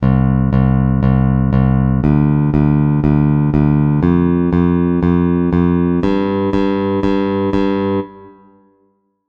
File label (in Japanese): ドレファソ ベースのみ